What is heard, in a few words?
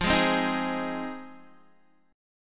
F Minor 7th, F, Guitar, Open D; down; chord shape